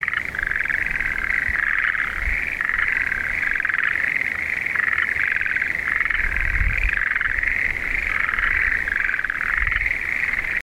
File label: frog; shure